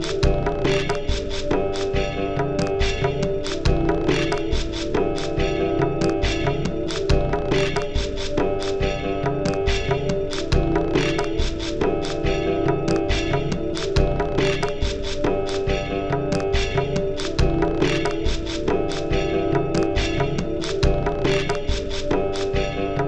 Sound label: Danse slow